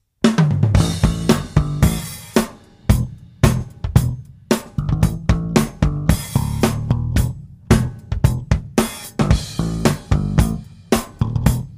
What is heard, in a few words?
backing riffcontest; Boudewijns riffcontest